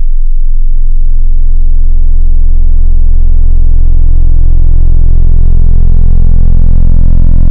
Dist Stomp Box, wavetable

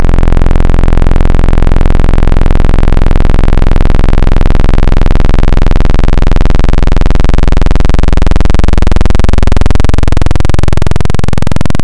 Analog PWM Custom, wavetable

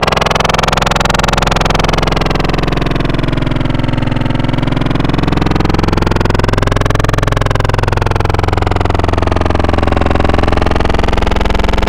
Growl, wavetable

